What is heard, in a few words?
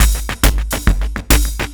percussion loops, Techno; PS-45